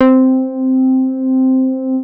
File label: MOOG BASS C4; Best Service Dream Experience